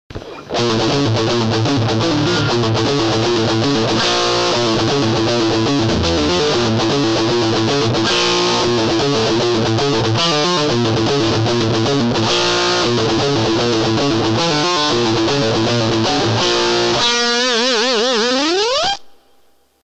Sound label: Rectifier Chorus